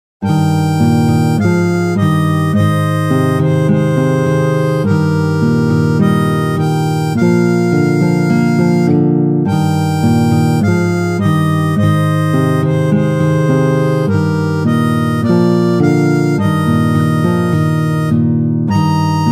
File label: Harmonica; Nearer My God to Thee